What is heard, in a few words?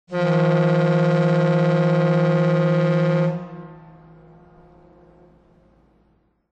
tug; WMBonusXP